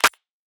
clap; eddyflux; dough-samples

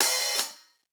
open hh; eddyflux

